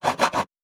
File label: Saw Wood; Survival Game Sounds - Lite Edition; Crafting